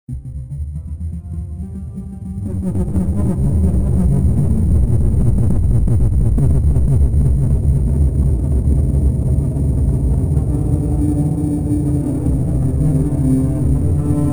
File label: ambient enviorment, Soundscapes; Crowdsourced Sample Pack